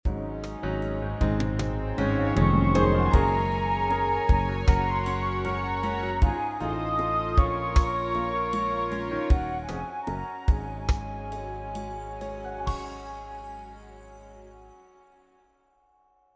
Scene Change to Backstage Instrumental; descendants

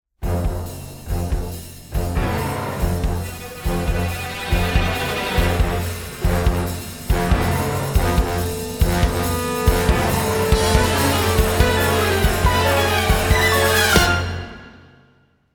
Barracuda Attack; finding nemo

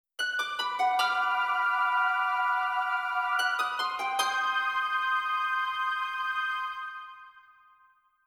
Shock; beetlejuice

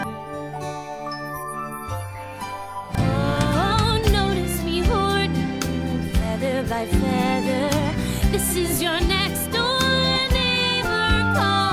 guide vocals

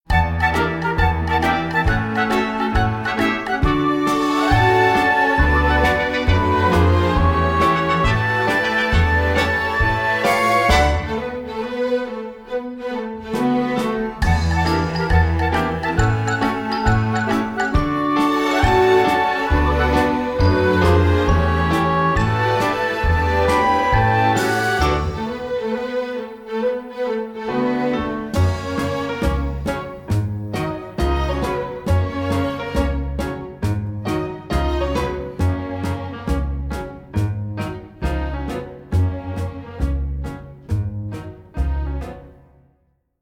Underground March

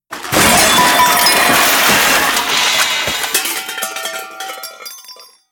Crash

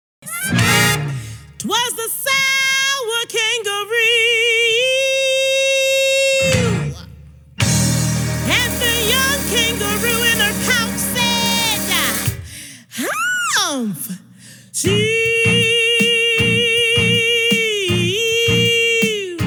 vocal guide; auditions

